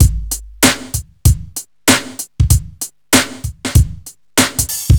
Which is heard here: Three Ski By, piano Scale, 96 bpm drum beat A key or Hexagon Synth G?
96 bpm drum beat A key